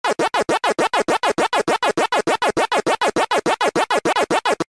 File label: chomp; pacman